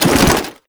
foley step, soldier; combine; soldier charger

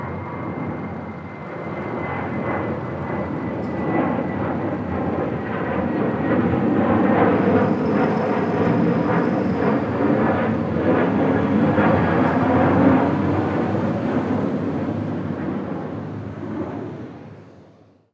airplane, overhead; bank

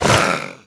growl, creatures; vox

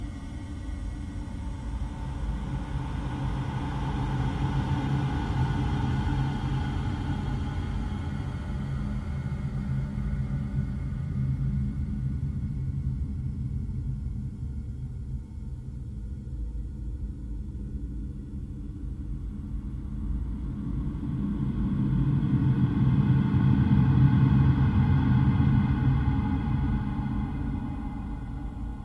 amb stretchwood lp, ambient; Half Life Model Pack

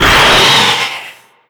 death, antlion; creatures; vox

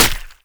flesh, bullet impacts; physics; Half Life Model Pack

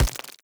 step layer, headcrab reviver, steps, creatures

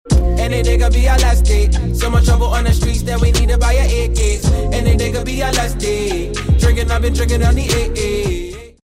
lost round, music

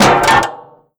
vent step, headcrab reviver, creatures

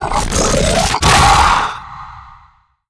vox run warning, zombie revived

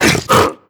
host abandon, headcrab reviver vox; creatures

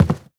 step, footsteps cardboard

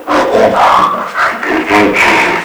hunter charge; npc; ministrider; Half Life 2; Episode 2

